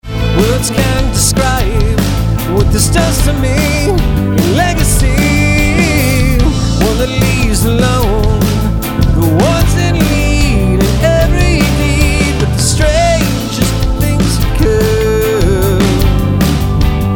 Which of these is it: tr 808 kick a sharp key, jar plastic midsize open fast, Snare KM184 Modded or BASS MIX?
BASS MIX